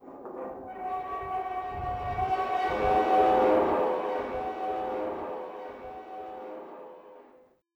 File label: park occasional mono